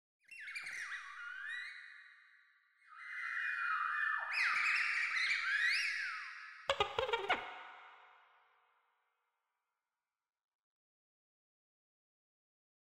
mouse Droid; starwars; episode vii the force awakens

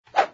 Whoosh; kickin it; bobby wasabi academy

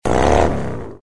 ui confirm; disneyxd; stuntastic

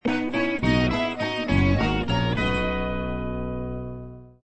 intro stinger; rainbow

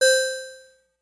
pong point win; toystory; aliens

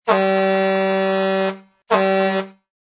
VEH Semi Horn; Mack; Cars

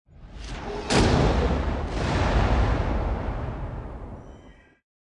thunder; snow white

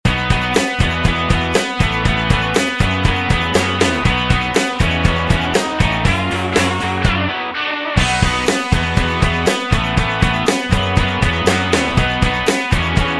bg loop

